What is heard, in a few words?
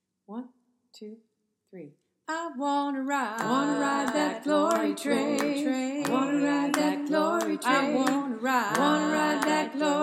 all parts with clap; choir; freedom choir